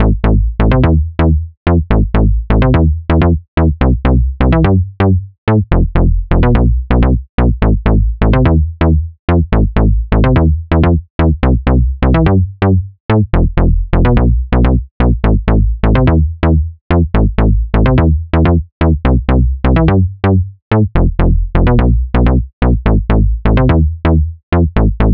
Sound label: BASS CLUB